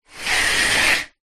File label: household shower curtain pull